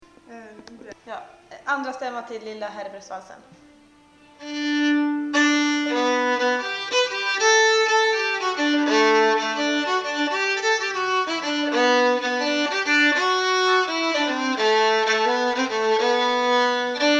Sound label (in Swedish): Lilla Härbresvalsen, andrastämma